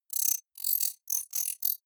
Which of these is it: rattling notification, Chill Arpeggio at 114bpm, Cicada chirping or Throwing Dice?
rattling notification